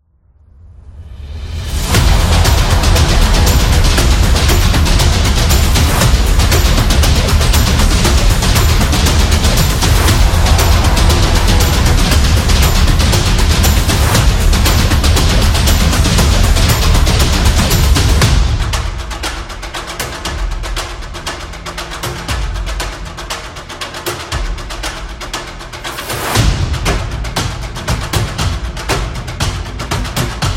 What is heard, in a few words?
Horror Chase